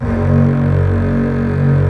ARCO STRGS M; OMI - Universe of Sounds

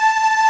Ending loop; SNES; Dracula X (Castlevania V - Vampire's Kiss)

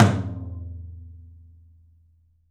open tom; 3D Sound; 3D Drums; 13 TOM

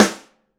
ST AMB SN, STEREO AMB SNARE

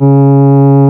REED C#; Big Fish Audio; Synclav FM

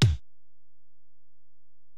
Ac BD, Alesis DM-PRO; 300 Drum Machines

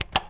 TURN OFF; AKAI Anime Sound FX

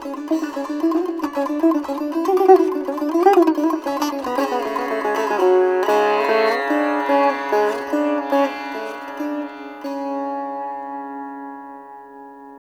SITAR; Spectrasonics; Heart of Asia

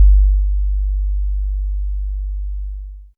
Sub, drum; Subs; Drum Bits; layering

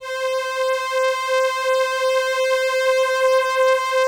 LAMBDA, String Machines

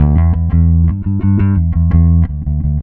Static Bass; Sonic Foundry (Sony Creative Software); Indie Rock Anthems; Session 13 - Static

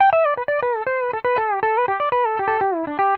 PD LOOPS 120, Whole Lotta Country

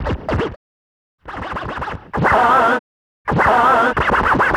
Wiggle Disc, Urban Grooves

